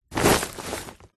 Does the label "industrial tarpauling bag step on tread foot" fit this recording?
Yes